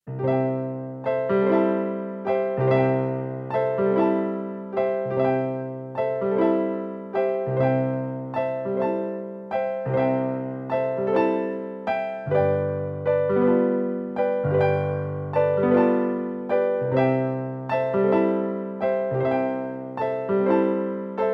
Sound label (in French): piano barcarolle